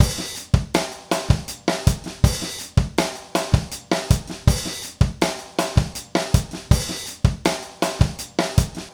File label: Acoustic Drumloop; Drums & Percussion